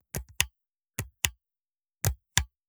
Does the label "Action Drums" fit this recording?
No